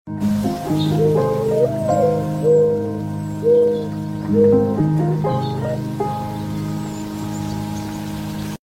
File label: chill guy song